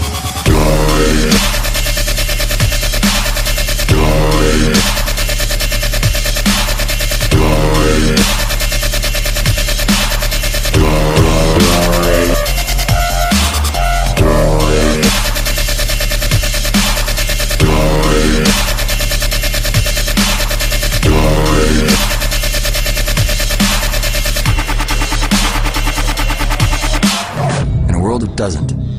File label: Droid Hard Bass